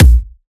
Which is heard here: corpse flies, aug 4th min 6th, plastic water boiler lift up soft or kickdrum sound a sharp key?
kickdrum sound a sharp key